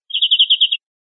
chorros cortos golpeados, verderon

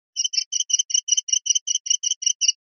cernicaleos grilleos, pardillo

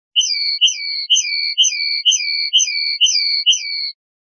rep sonajas

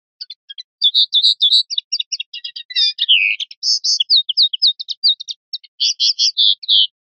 rep primaveras, pardillo; linked files test pardillo

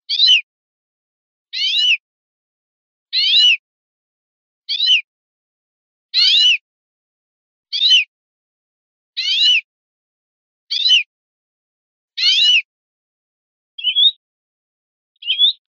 quejas meliu, verderon

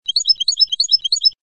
floreo tilili tilili; campero

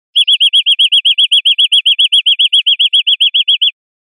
golpes corridos biobio, verderon

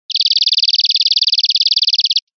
encarrizado basto; verderon

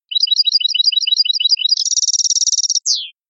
mecida, campero

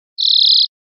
grilleras; verderon

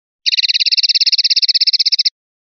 rep carretillas, pardillo

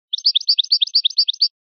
campanilleos capi capi; campero

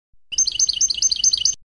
campanilleos tili tili; campero

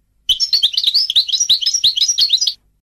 adorno de tabli; campero

